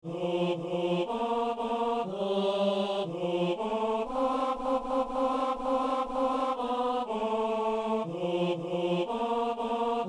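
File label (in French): messe sanctus ténor; messe de saint jean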